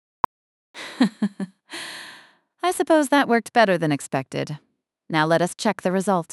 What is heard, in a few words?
chuckle then speech